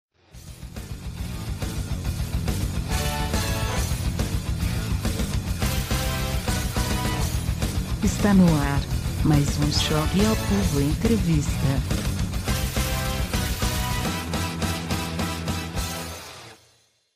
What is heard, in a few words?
jingle